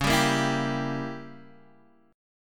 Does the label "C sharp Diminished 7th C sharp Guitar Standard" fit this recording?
Yes